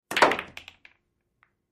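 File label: Pool Game Billiard Balls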